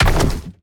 footstep; argus; smite; hera

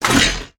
jetpack open; smite; Anhur

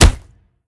hit minion; smite; gilgamesh

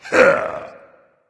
grunt attack dragon; fafnir

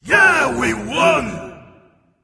yay; cuchulainn

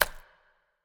kraken bite; smite; poseidon

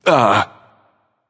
surprised; smite; heimdallr

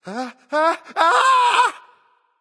fear; Smite; baronsamedi

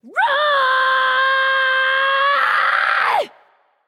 battlecry; smite; kali

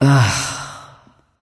"ugh"; smite; nike

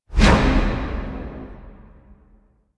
auto swing; smite; poseidon

